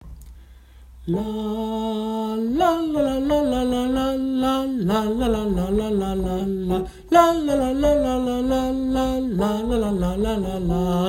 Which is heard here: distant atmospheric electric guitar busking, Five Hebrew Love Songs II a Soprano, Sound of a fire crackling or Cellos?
Five Hebrew Love Songs II a Soprano